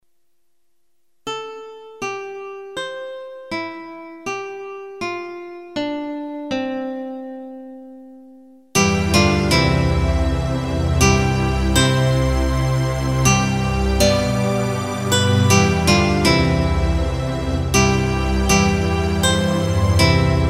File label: instrumental; Manancial de Todo Gozo; (O God, The Spring of All Our Joys); Hinário "Hinos"